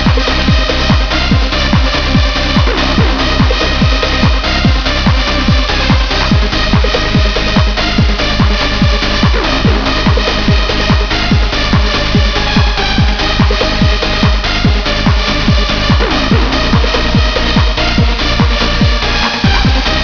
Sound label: techno; hoodedsnipersounds